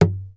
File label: WOOD, Bass Library; Sampleheads; Will Lee Bass Library